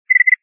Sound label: PDA Message sms